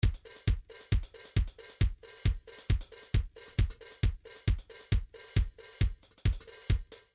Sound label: trance midi loops beats